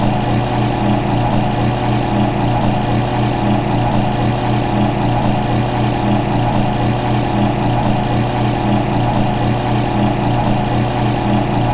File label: filtration machine